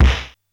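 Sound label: Snare Drum LoFi Bats; Polyend; Legowelt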